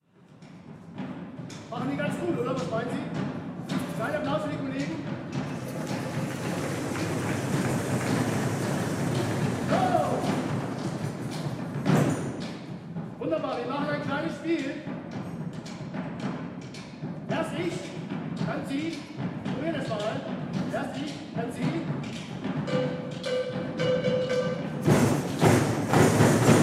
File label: drumcircle